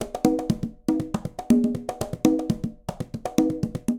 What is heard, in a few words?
Conga B; Latin Percussion; Instrument Kits; Ableton; Factory Packs